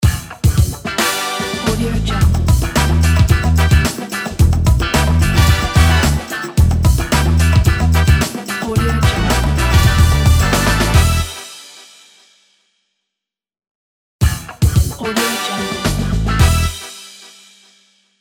funky bumper logo

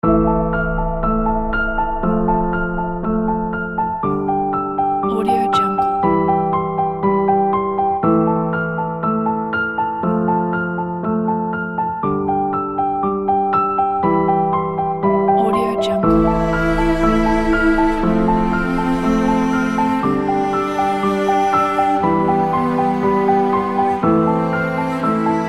cinematic piano

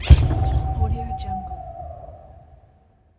haunted animal hit

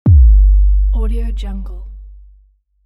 sub drop down

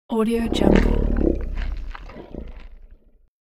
demon dinosaur dragon eating

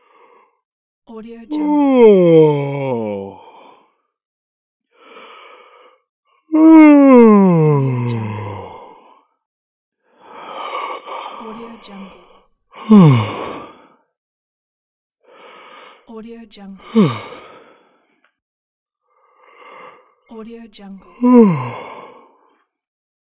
male yawn

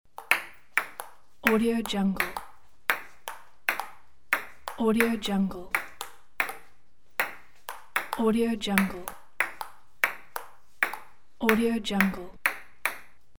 table tennis

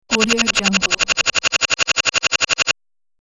scifi loading bar

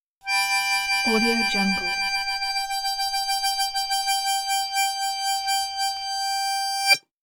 harmonica accent

